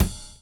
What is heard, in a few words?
RIDE, RIDING; AMG Gota Yashiki Groove Activator